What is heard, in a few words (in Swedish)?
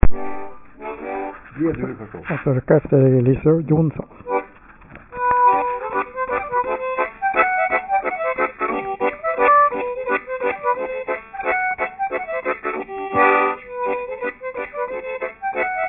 Mazurka; e E Johnsson; Arkivinspelningar